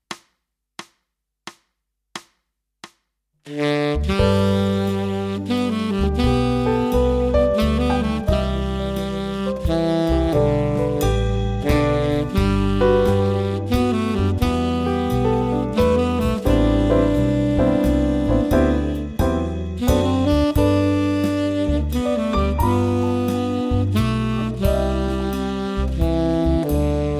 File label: Tenor